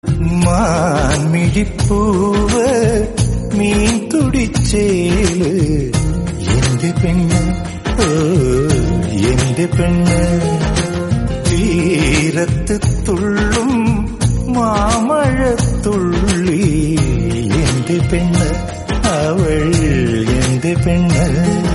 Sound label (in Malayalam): ringtone malayalam; mahasamudram